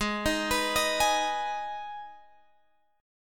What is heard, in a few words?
Minor, Guitar, Open F; G#m; arpeggiated; x,15,15,18,18,15